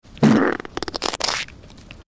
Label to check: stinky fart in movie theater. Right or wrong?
Right